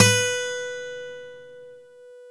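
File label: GTR B4 AC F; Synclavier Sampler Library; KEYS AND GUITARS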